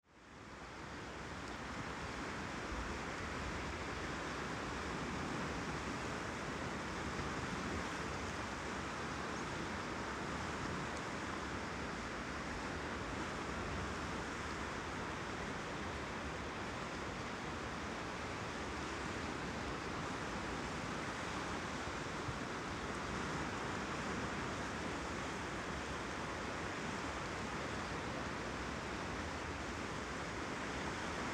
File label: Dike; lesconil2025